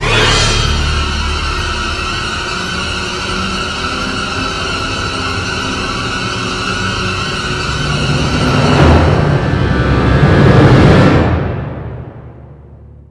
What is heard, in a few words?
High Tension Stinger; Mass Effect Infiltrator